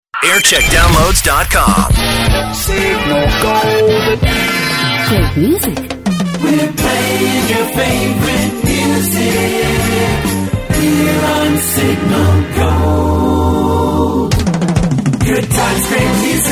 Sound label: jingles; signal gold